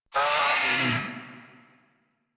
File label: Kavat Vocal Death; Warframe; Khora